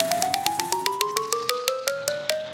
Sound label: wheel spin down